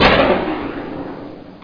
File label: crack